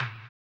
hambone; ILIO; Vocal Planet VOL-2; Blues & Soul

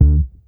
IN HOUSE BASS; Sampleheads; Ultra Freakin' Bass

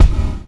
GATE BD, Drums Acoustic; USB Soundscan vol.10; AKAI; 90s sample CD; GATEKIT 3

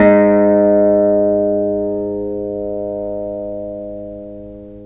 Veena G#3

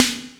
Snare, Snaredrum; Equipped Music - Loungin House

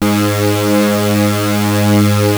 Prophet 5 G#3, synthesizer; Hollywood Edge - Giorgio Moroder Rare Synthesizer Collection

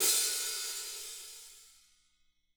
hat, drum

